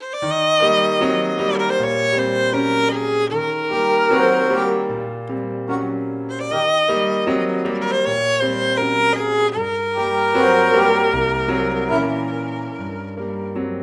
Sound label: Loop; The Act; Arcade, iOS, MacOS, Mobile